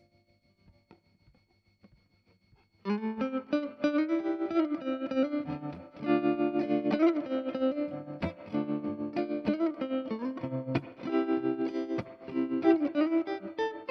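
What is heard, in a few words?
guitar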